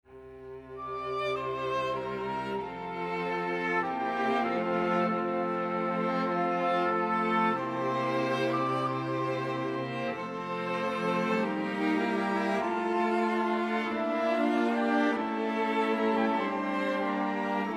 instrumental

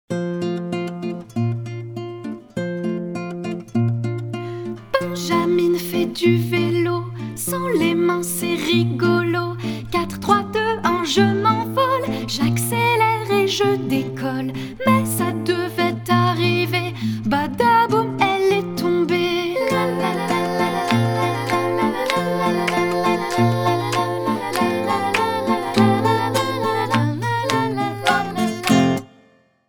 comptine

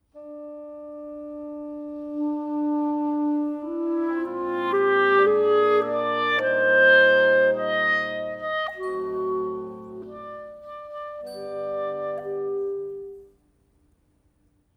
instrumental melodie

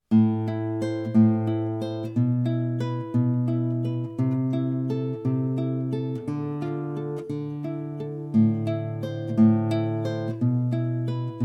accompagnement seul

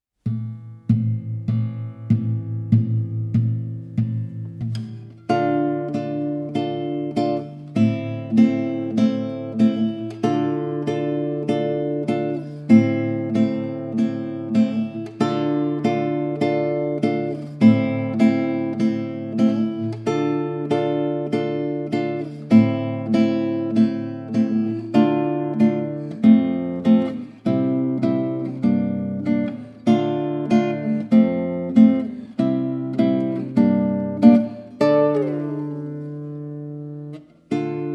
instrumental

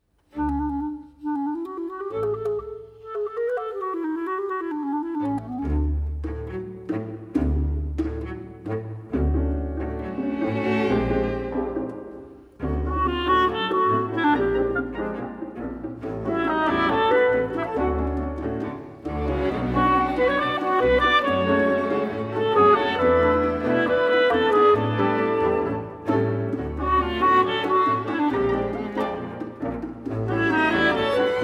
instrumental melodie

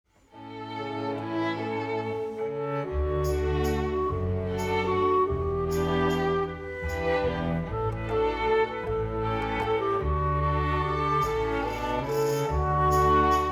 orchestre; petit prince; planète libre

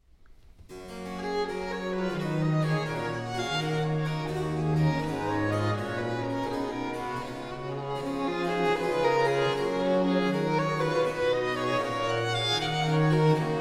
choeur; Jesus bleibet meine Freude

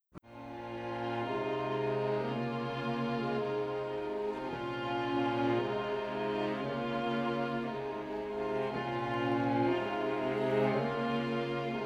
Orch seul; un petit prince

